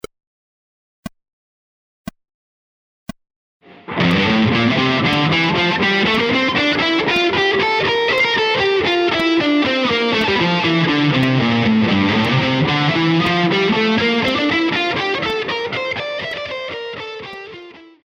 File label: scales combining rhythmic variations slow